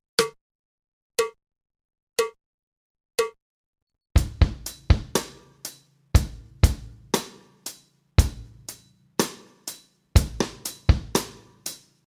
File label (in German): Uebung, schlagzeug